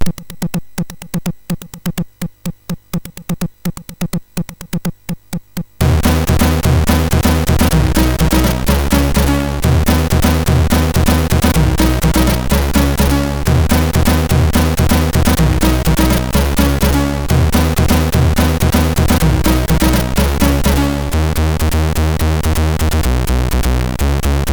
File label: Techno, sid MOS6581R3; hvsc